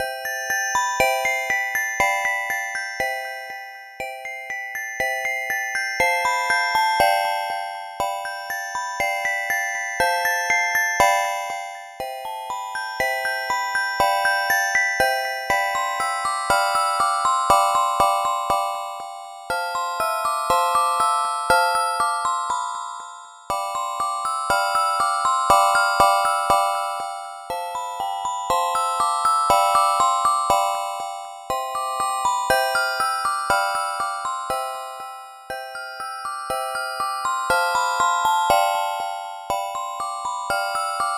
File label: classical